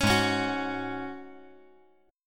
Ab 7th Suspended 4th Ab down; Ab7sus4 strummed

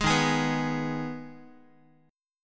D sharp Suspended 4th Sharp 5th, Guitar

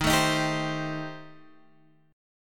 A Minor 7th Flat 5th D sharp

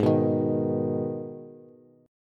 G sharp Minor G sharp down Bass, chord; G#m strummed; JGuitar

